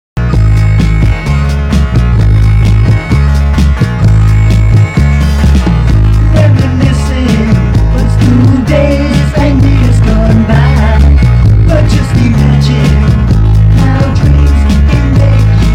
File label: 1990s indie